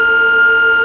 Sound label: forklift down up loop; hl2 ep2; ambient, levels, outland